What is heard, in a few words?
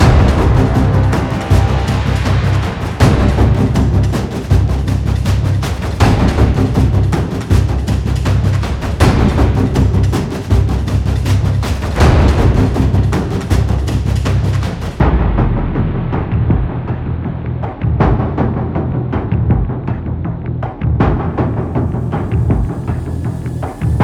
tension violin; tension gerilim vol 12; By Burak Sound Bank